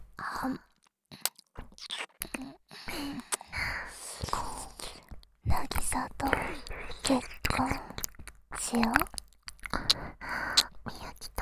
耳舐め

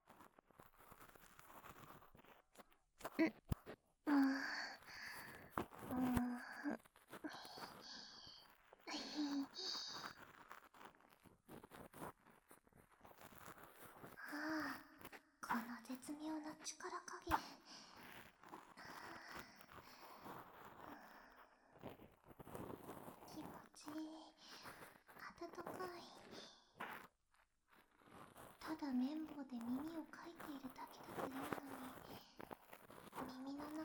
左耳かき; 私に耳かきしてもいいのだぞ

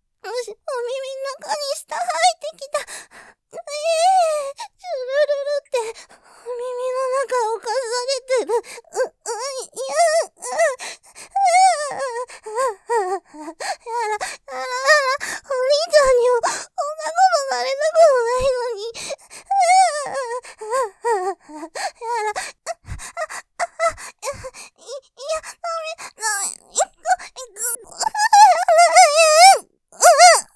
喘ぎ, ASMR; 耳の中を舐められ犯されちゃう・感じすぎヤバイ; ループで楽しむ！シェフの気まぐれ抜き抜きコース; モノラル

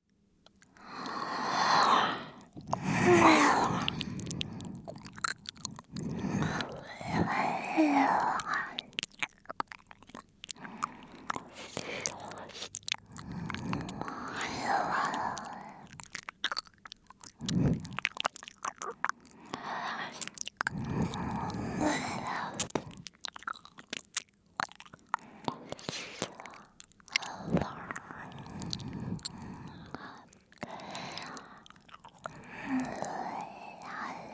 耳舐め 右耳 優しく ぺろぺろ 声なし ノイズ除去なし; カスタマイズトラック; フリー耳舐め（右耳パーツ）

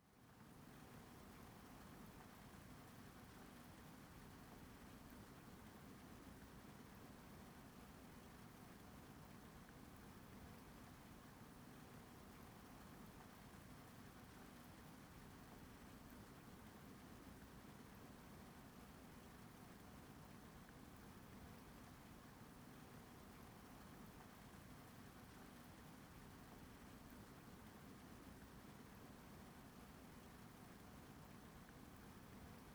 雨音ループ

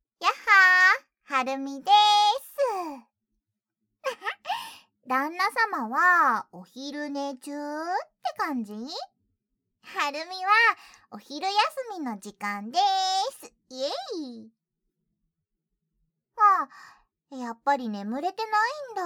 ナース キスとお耳舐め; ナース晴海; 癒し系専属ナース愛華さんといのりママ

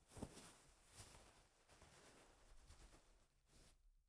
布ズレ, 効果音

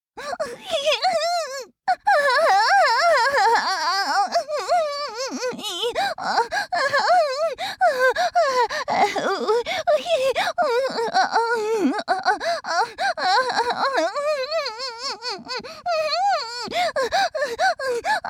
自慰(強)：激しく性器をいじる; 実用ボイス抜粋集

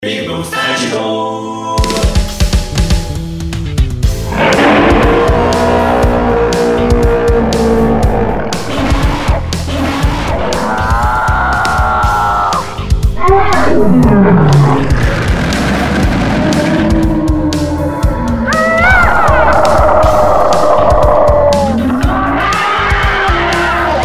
効果音素材集 怪獣王; ぴぐみょん効果音素材集; ぴぐみょんスタジオ

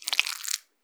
膣内射精

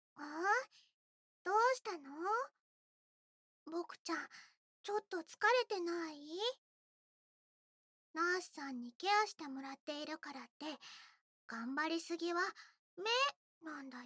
耳かき; 菜々子ママ; サークルナース

